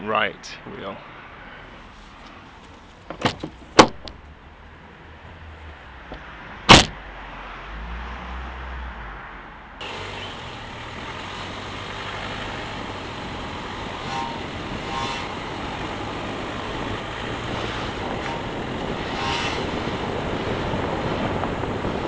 grinding sound when accelerate possibly front wheel s front right wheel; discovery sport